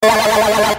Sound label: wah wah; climbo